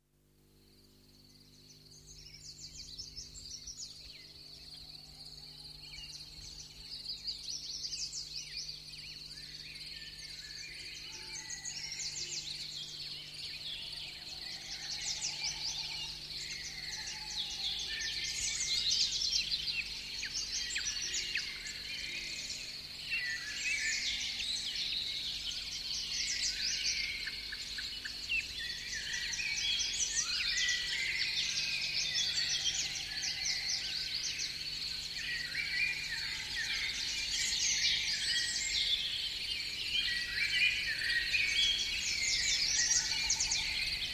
Morning Birdsong

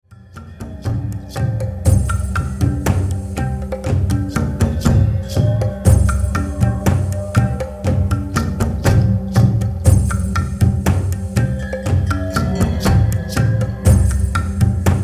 North Wind Rhythms

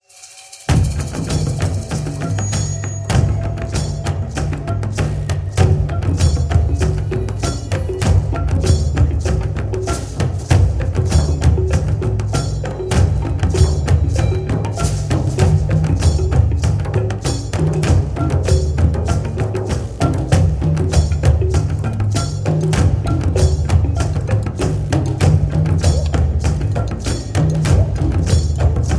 Sacred Water Rhythms

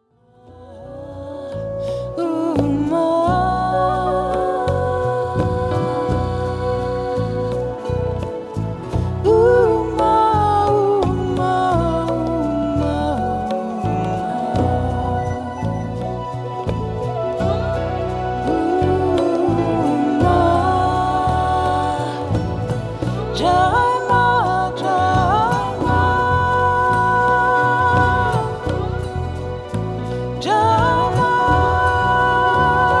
Chakra Healing Chants

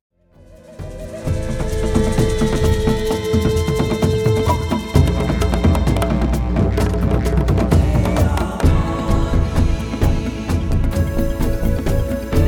Drum

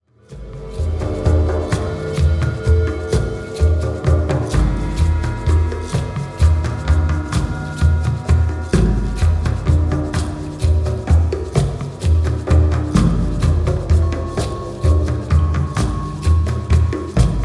Mantra Drum

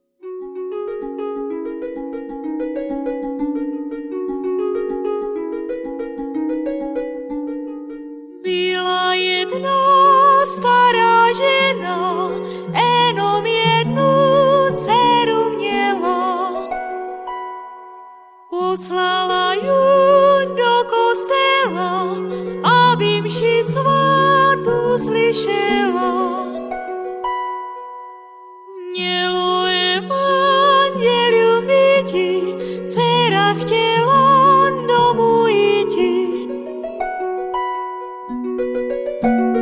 Sacred Voice

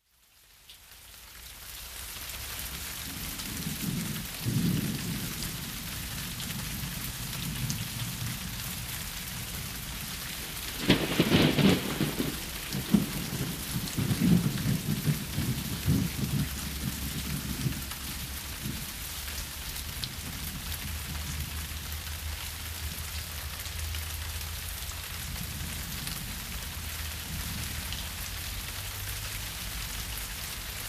Thunderstorm

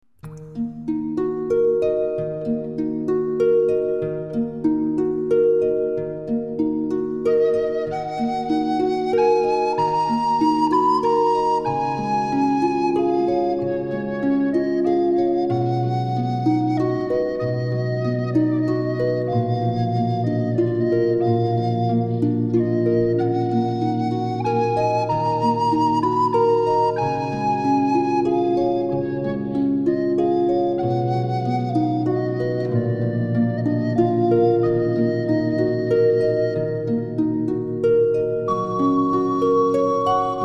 Magical Harp

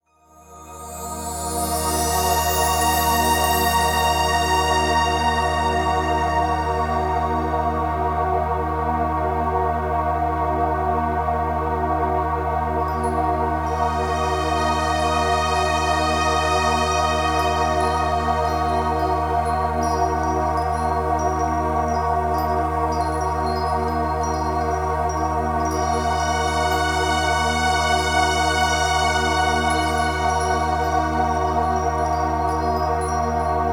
Music for Reiki and Meditation

